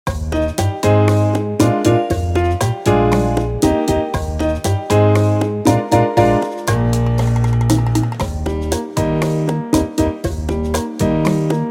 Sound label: guajira